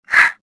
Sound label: jump; stofficer